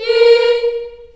A#3, Choir Acoustic & Synth; CHILD; USB Soundscan vol.28; AKAI; CHILD IHS

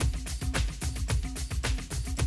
Classic DrumLoops, 110

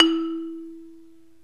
MARIMBA VIBES

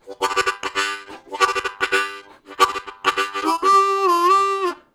Diddlydit De Dee, Harmonica, Riffs; Zero G

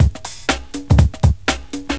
Simple Groove, breakcore Breaks

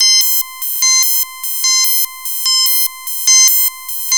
FM BEAT, FM LOOPS; Zero-G Ambient 1

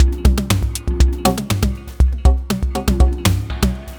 Terrestrial Hybrid, Processed Percussion

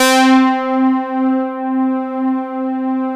SYN, analog

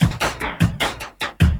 LOOP, Drum & Bass, 150 BPM

